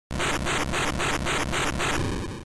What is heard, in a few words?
Shooter Damage